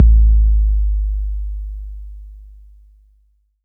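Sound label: bass drum 808 Tape Decay F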